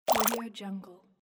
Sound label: water scroll